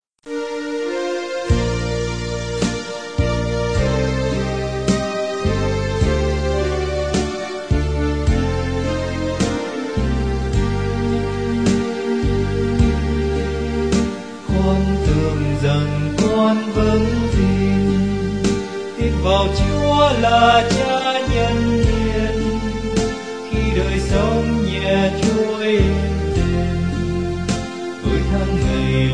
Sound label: bass